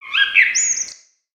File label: Peaceful Bird One shot